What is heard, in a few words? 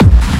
Techno White Noise Kick